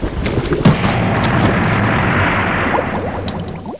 boat; PC Gamer IT CD 48

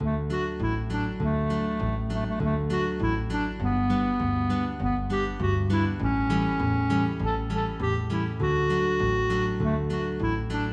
jingle b

